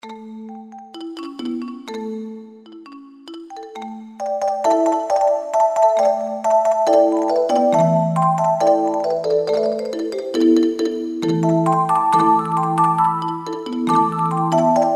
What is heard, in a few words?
China Bell, Korg 05RW